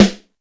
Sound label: Roland U110 Rock Drums E5